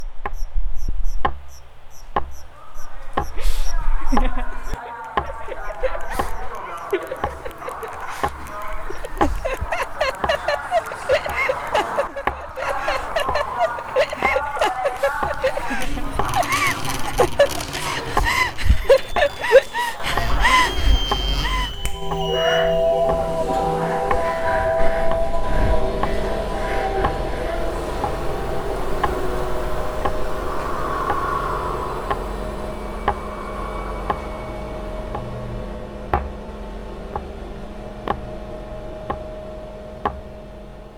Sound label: Memory Soundscape